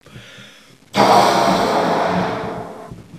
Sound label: blow